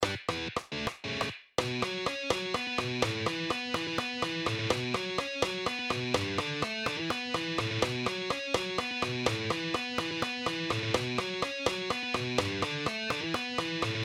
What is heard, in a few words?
riff; progressive guitar riff; odd time signature